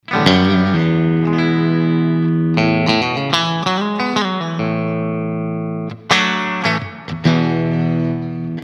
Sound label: jazzy